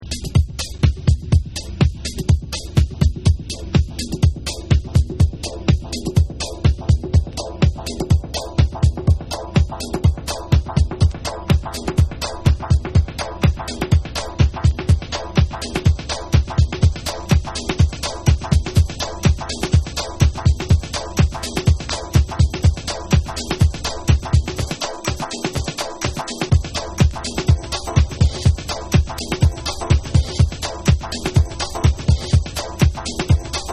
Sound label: PHILLY RIFF